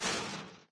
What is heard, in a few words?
chainlink, footsteps, walk; player; source engine; left 4 dead 2; boomer